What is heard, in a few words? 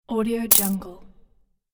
golden coin falls; catsoundstudio